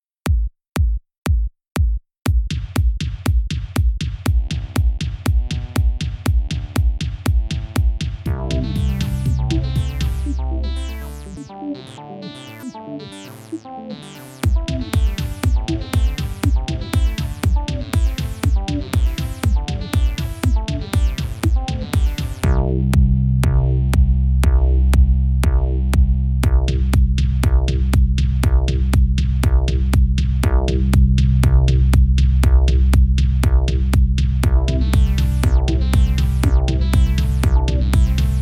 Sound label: electric hoedown remix